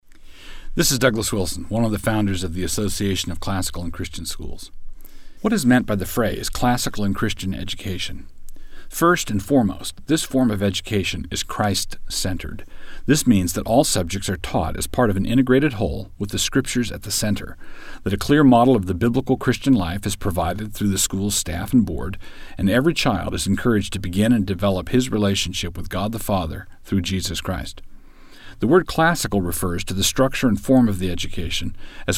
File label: Classical and Christian